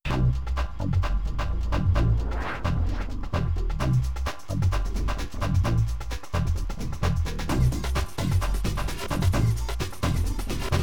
tunnel run

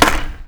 ollie conc

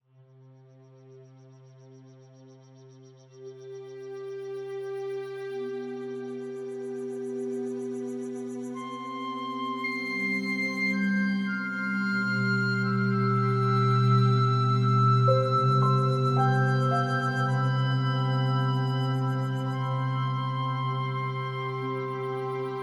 underwater concept

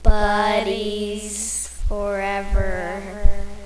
scary

